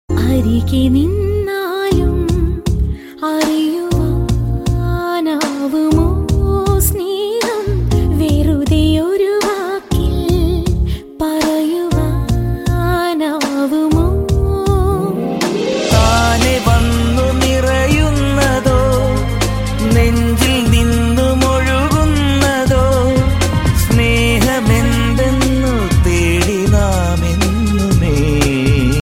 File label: malayalam tune